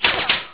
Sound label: Small Impact; GLScene; AirBlast